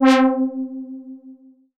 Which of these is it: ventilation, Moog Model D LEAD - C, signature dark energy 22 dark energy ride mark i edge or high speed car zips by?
Moog Model D LEAD - C